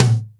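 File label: Acoustic mid tom, Roland MC-303 Toms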